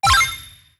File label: Bonus Balloon